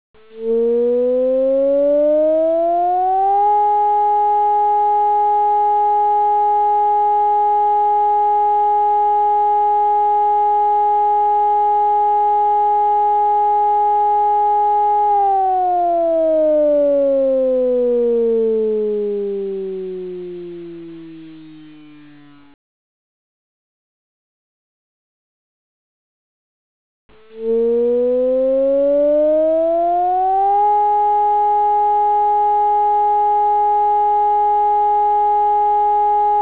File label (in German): Feuer, sirenen